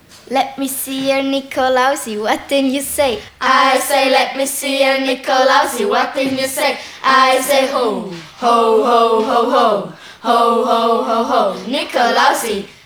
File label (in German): pausenglocke